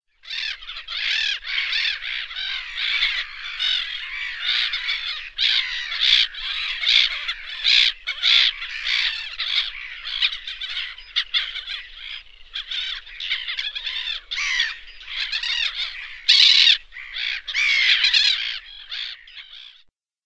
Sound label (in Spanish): gaviota reidora